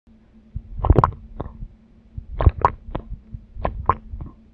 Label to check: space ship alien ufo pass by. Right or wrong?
Wrong